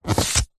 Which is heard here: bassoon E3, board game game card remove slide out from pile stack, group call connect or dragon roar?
board game game card remove slide out from pile stack